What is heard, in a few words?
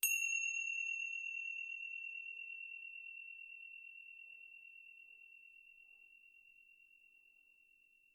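tibetan cymbal close sound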